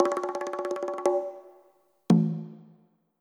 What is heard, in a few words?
conga drum high roll low hit